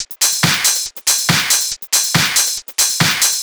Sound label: Loop 140 BPM; Vengeance Samples